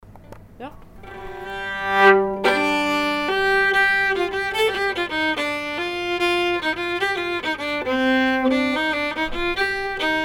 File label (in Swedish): Stämma Stenselepolskan